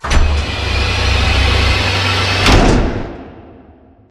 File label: Assembly Floor Lift; Boomtown; Titanfall 2